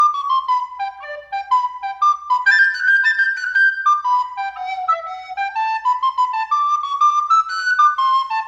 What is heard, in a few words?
flute; spongebob